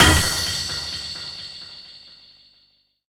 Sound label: DANCE FX